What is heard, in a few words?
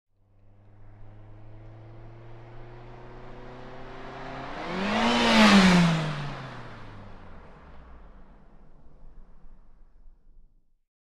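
car passing by fast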